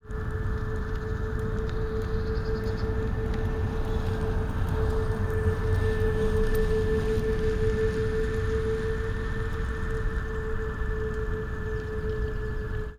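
pass By backwards, EV3 Pass-By, electric vehicle that used only two amplitude-modulated tones as AVAS; This specific sound is only audible when the vehicle drives backward.; model Y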